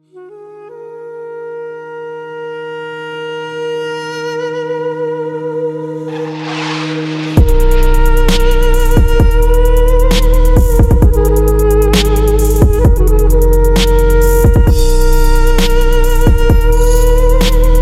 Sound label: duduk type beat